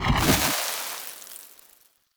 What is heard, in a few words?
Blind Sand Shot; Powers; Fey; Saboteur